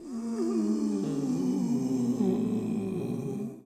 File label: Mummy